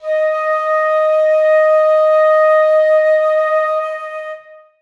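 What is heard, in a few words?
flutes sustain d#4; Sonatina Symphonic Orchestra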